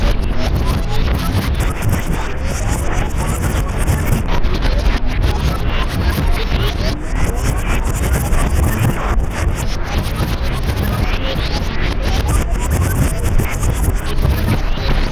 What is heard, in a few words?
reverse fractured texture scary, horror